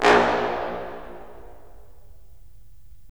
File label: BRS, Roland LA Composer